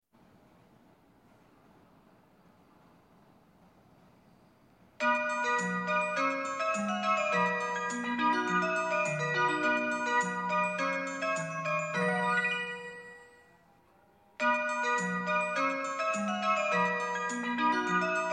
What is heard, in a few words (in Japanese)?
shinjuku hassya; 発車放送; 新宿